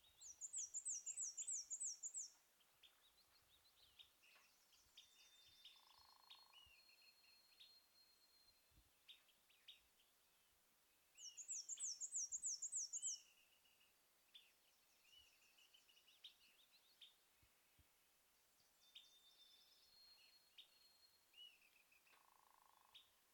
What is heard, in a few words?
Black-and-white Warbler Mniotilta varia